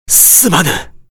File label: die